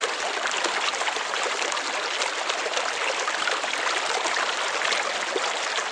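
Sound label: ambient water stream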